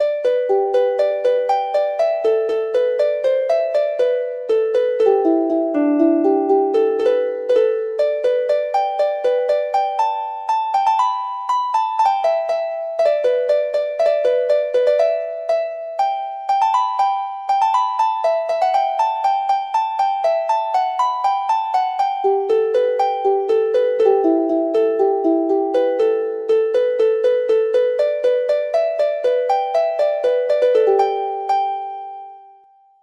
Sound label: harp